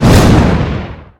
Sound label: whoosh hand; gundyr